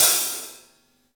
V-Pop Open Hi Hat, Roland BK-5

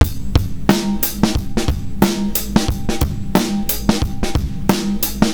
R&B Drums

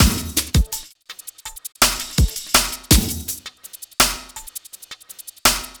Beat, Futurist Drum & Bass; Drums & Percussion

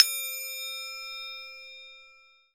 temple bell; AKAI S-Series CD-ROM Sound Library VOL-5

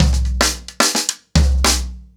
Crash 110BPM, ACOUSTIC DRUMS

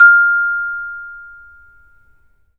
vibes; 3D Sound; 3D Keys VOL-1

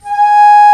alto flute mf g#3; Digital Sound Factory Virtuoso

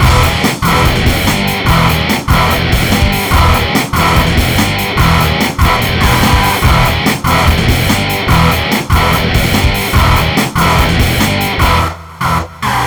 Hardcore Breakbeat, 145 Emin; Big Fish Audio; Hardcore Breakbeat Action; Breakneck

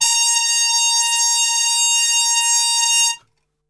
A#3, Brass Super Section TRP HARMUTE; Best Service; 90 s Sample CDs

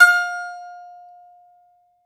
BAGLAMA F0LL; E-MU Producer Series Vol. 7 – Old World Instruments

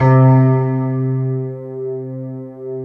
ISLAND PN

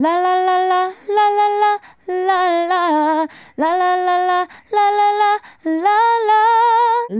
Female Solo, Hip-Hop and RnB Vocals

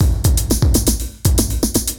CB LOOP; Zero-G; Chemical Beats

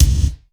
GATE KICK; Crypton - DrumBase5000

